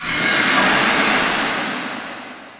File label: inside battle antlion, prison; Half Life Model Pack; Half Life 2; ambient levels